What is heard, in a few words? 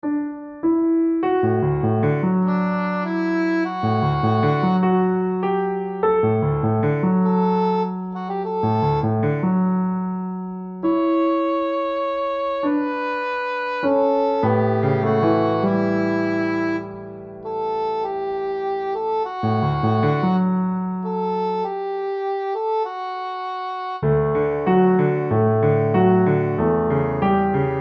accompaniment, piano